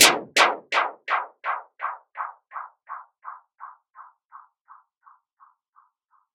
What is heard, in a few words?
126 B#m Fx, electro synths; Prime Loops; Complex Electro Synths; Biomass